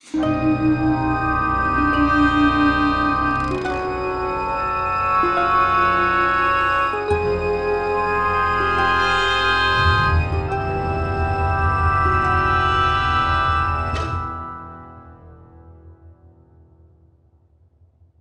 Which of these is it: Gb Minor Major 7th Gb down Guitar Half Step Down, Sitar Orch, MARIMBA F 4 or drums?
Sitar Orch